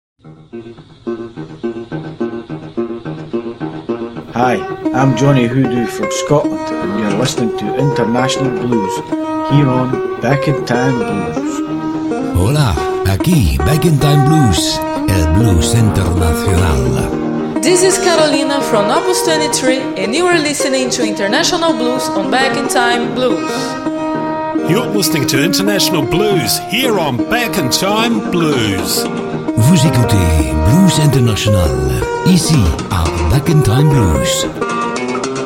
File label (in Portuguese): vinheta da radio blues